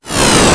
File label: fire; Pokemon Solar Light & Lunar Dark 1.0.1